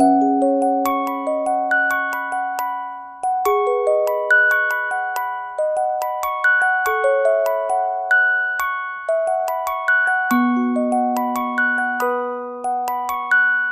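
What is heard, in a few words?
keys music box; loops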